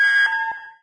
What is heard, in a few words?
alarm; DOOM